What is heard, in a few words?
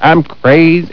song